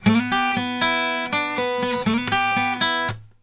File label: Blues Guitar Samples; ILIO; Fretworks; LICK